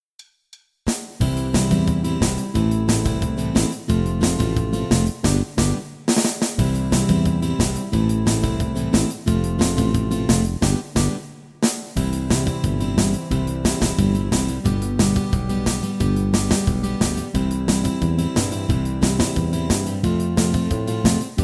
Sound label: (no lead guitar)